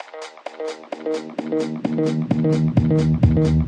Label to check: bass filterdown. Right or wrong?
Right